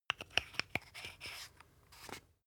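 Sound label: toothpaste close, Bathroom; haus-garten; badezimmer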